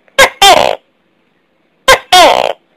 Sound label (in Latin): Chamaeleo calyptratus